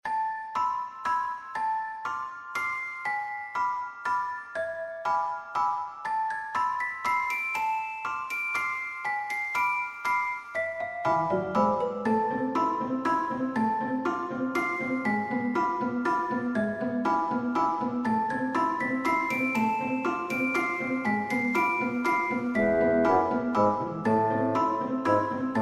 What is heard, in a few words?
Sad Melody; Nocturne XVIII : Halloween